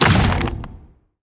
enemy soulcaller tower Block in

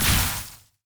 tower arcane Wizard basic Attack

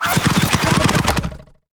arrow storm; heroes vesper

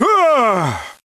Holy Paladin Death

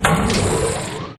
drill In; dwaarp

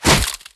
shard spear impact; durax

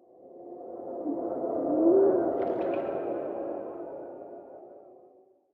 ambient wind

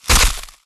ultimate; durax

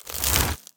unstable crystal crystallize

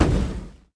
tower necromancer basic Attack hit

